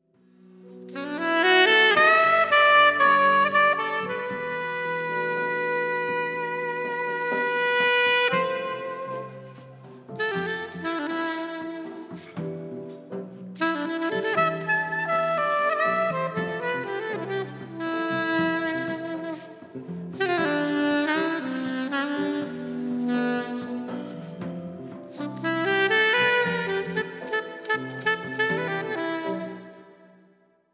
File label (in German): Sopransax EMT250